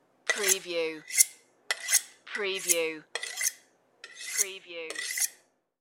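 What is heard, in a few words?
Sharpening Knife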